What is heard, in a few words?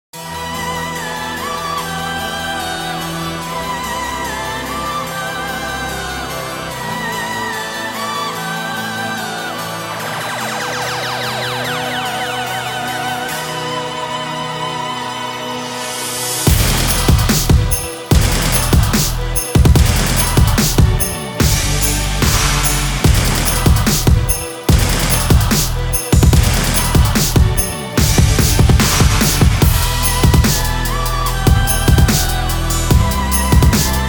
Deutschrap Drum Kit AGGRO Edition